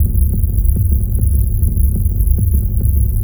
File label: Soundscape; FX